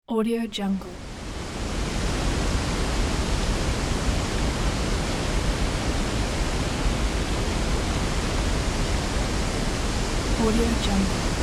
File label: heavy waterfall